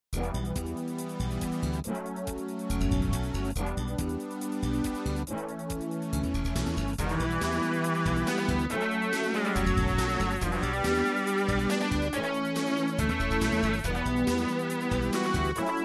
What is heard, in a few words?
MIDI Scene